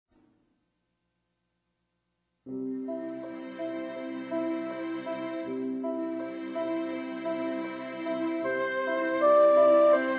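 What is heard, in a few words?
flute piano strings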